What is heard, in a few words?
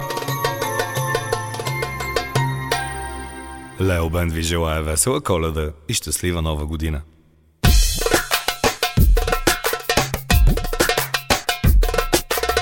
jingle bells